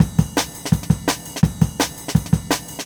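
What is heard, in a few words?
Jungle Breaks, 168 bpm; Original Jungle Breaks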